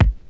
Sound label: natural bass drum one shot g sharp key